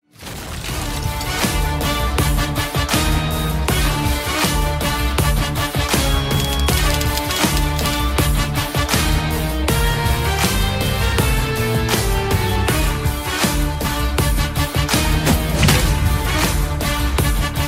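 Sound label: mass background music ringtone; ramrao on duty; ravi tej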